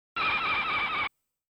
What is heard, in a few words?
BRAKE